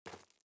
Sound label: SFX run gravel; out of reach treasure royale 2020 gamerip pc